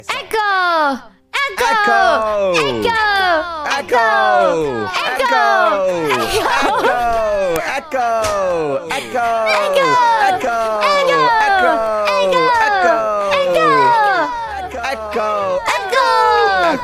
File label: echo